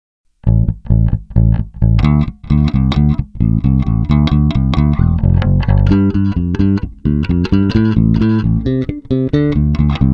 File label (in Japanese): TAURAMアクティブ・指弾き（EQセンター）, ベース; ピックアップにFutraと書いてあったがオリジナルブランドだそう。